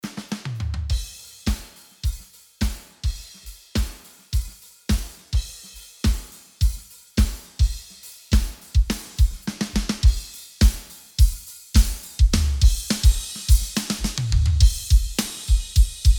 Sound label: Drums; tubetech; pultec shootout